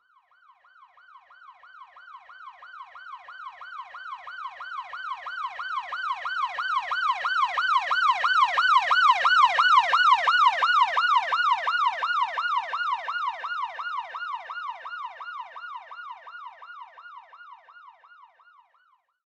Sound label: Emergency Police Siren Pass By; Sirens (Sound Effects:Electronics)